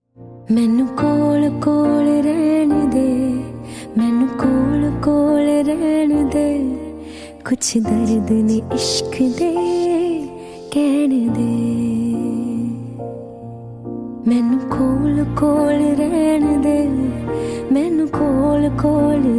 Punjabi Ringtone

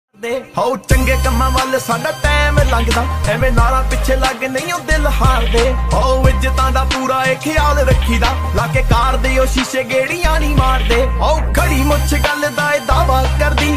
Punjabi Tune Ringtone

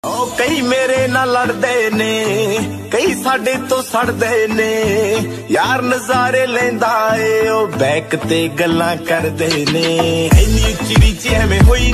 Punjabi Ringtone

